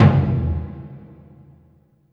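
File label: Burundi drum, Heart of Africa; Spectrasonics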